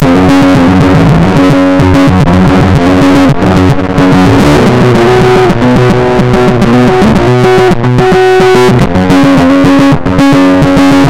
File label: bass fast harmonic; deathbyaudio